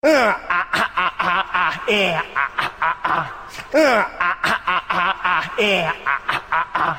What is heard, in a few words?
Heaaaaa Heaaaa; Italian Ringtone